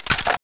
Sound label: m249 box out; czero opforce